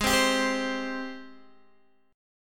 Ab Major 7th Ab down Guitar DADGAD

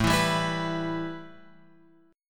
A 7th Suspended 2nd; A7sus2 Chord {5 7 5 X 5 7} strummed

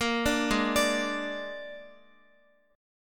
Ab Suspended 2nd Flat 5th Ab Guitar; arpeggiated; JGuitar

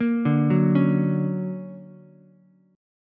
D Augmented Major 7th, down, Ukulele; D+M7 arpeggiated; JGuitar

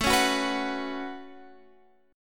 A 6th Add 9th A down Guitar Drop D

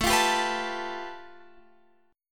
A 7th Suspended 2nd Sharp 5th A, Guitar Open F; A7sus2#5; strummed

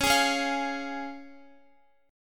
Db Major Db Guitar; strummed; x,x,11,13,13,12

